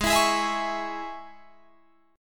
G sharp Suspended 4th Sharp 5th G sharp, Guitar Drop D; 18,19,18,21,21,21